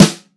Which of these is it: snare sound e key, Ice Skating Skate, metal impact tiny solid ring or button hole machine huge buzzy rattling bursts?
snare sound e key